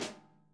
SNARE TAP; Sonic Reality; ID SNARE 3+

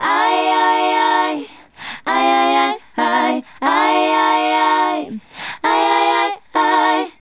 Female Group, Hip-Hop and RnB Vocals

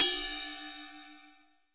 RIDE BELL; EdgeSounds; Drum Mashines; R-8 DRUMS

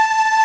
Ending loop; SNES; Dracula X (Castlevania V - Vampire's Kiss)

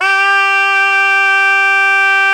MIZMAR G3, Earth Tones

